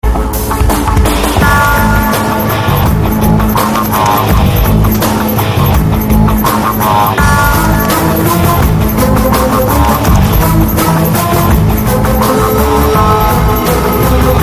Rock

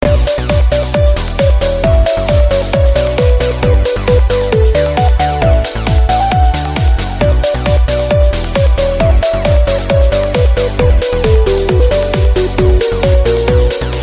Xylophone

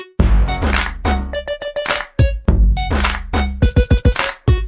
Soft beat

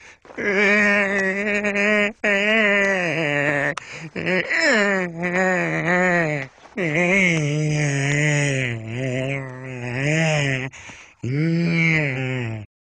Moan; Peter Griffin

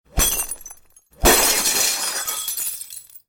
Glass break sms

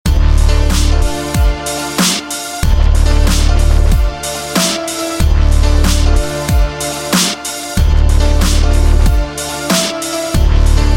Grimebeat

